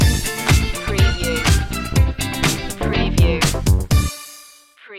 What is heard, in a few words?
groovy chic